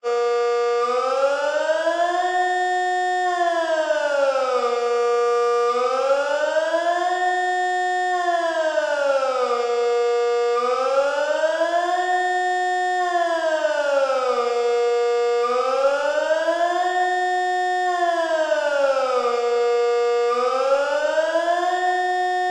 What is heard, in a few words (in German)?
Allgemeiner Alarm; BABS